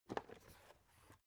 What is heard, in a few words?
alu case small handle